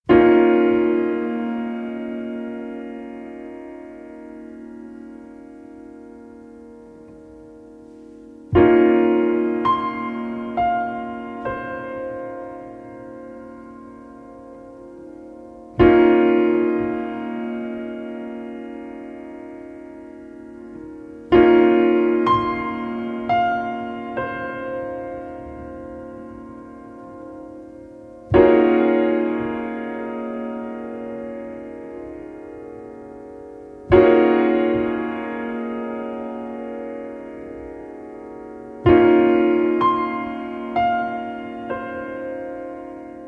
Solo Piano

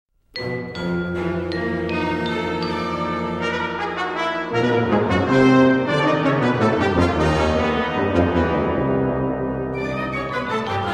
Concertino For Brass Quintet and Chamber Orch

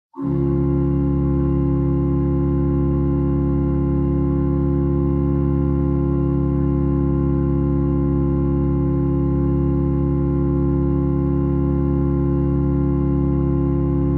Meantone Canon

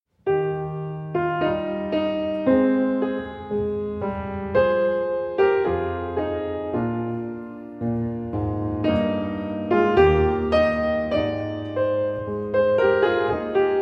Violin, Viola and Piano; Rondino in E-Flat Major

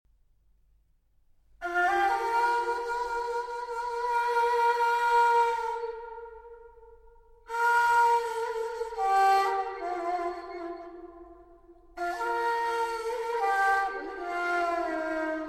improvisation ney

